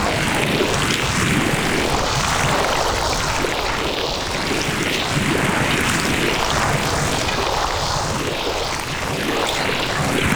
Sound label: Health Leech Loop; Warframe; Eximus